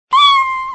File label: cat